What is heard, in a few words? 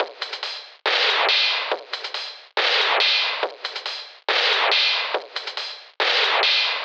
LOOP